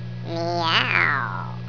meoooooow

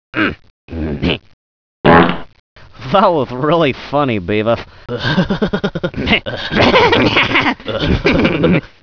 fart

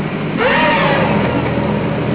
duel noise

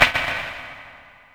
echo

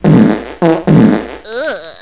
fart

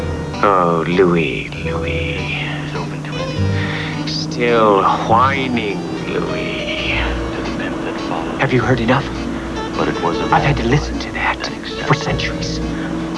whining

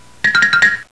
twitch

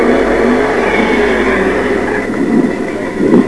burnout

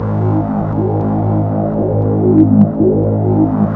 SWEEP

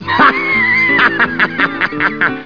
laugh

